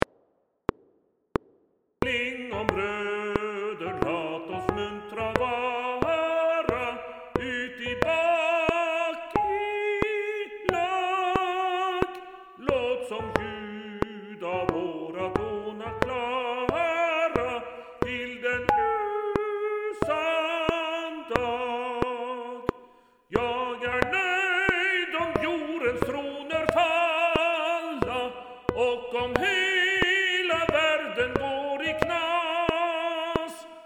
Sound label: Tenor 1; Klingom brødre